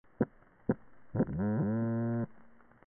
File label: Bocon toadfish whole call